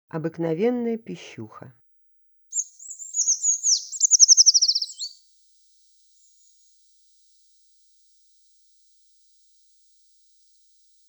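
obyknovennaya pischuha golos, common treecreeper voice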